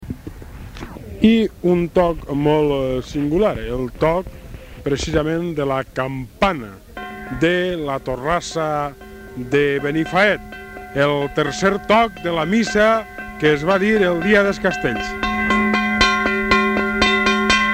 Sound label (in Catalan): campana torrassa de Benifaet